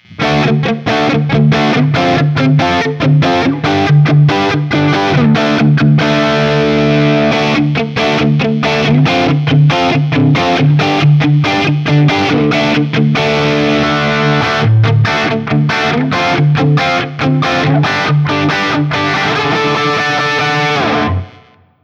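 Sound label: Burnside Lance JCM800 D, guitar; guild burnside lance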